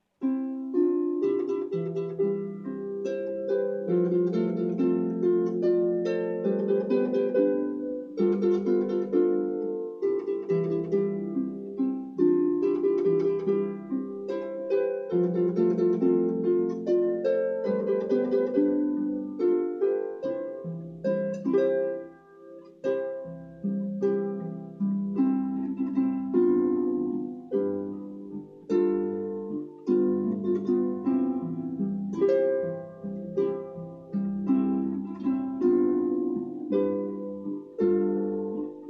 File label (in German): Landler